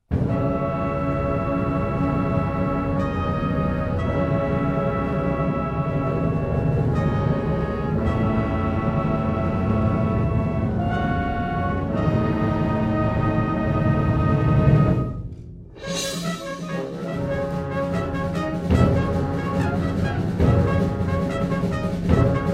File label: 300 violins sight read